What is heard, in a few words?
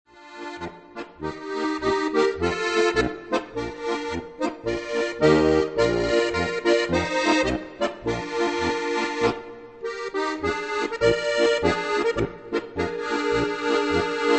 bierglasl marsch, Steirische Harmonika